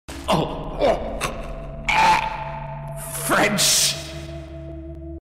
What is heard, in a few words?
eugh, French